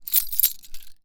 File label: Keys Pickup; zps